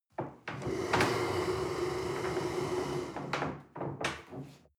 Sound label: table slide on floor